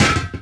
bouncy metal tube hit; bouncy objects; Tony Hawk's Project 8; XBOX

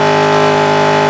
rpm, Engine; Trophy; Cars; XBOX

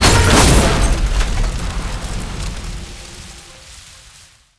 truck

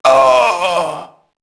mobster death; enemy; Max Payne

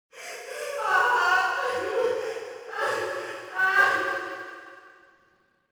vil cry; ambience; Soldier Of Fortune II Double Helix; XBOX

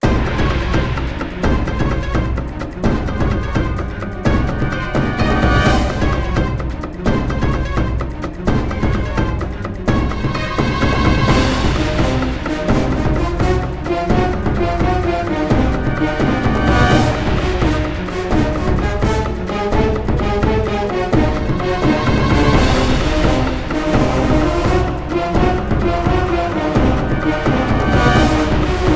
general intense; Xiaolin Showdown; XBOX

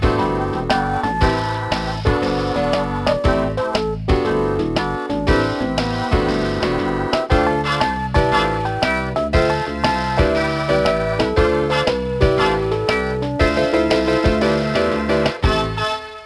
MUSIC; Big Mutha Truckers 2

